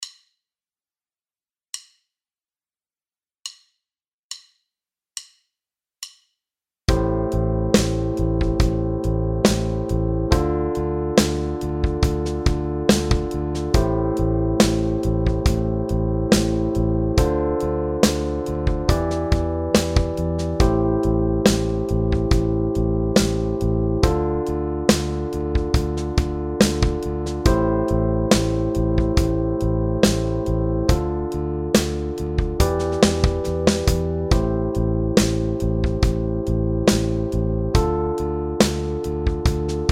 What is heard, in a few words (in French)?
La mineur Pentatonique Backing Track 70 bpm